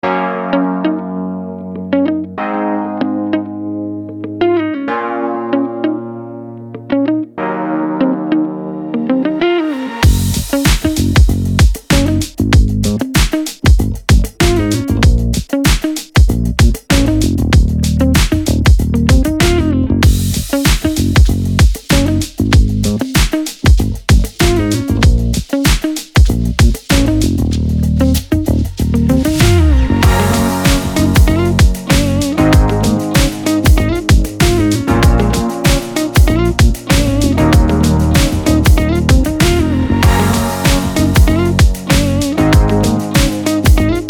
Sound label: Summer funk